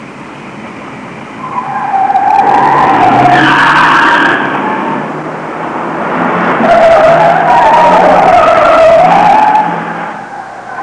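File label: car chase, transportation; Web Clip Empire 150,000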